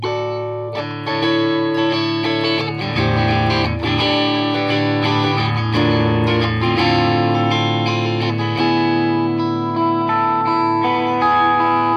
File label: clean